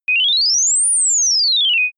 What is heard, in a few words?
general rise and fall short soft